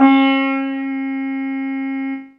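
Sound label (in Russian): ситар одиночный мягкий далекий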